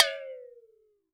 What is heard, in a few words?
WATERBEL, WATERBELL; Univers Sons - Raricussions